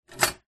household popcorn maker machine metal kettle lid close